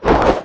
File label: guardian attack; jorgan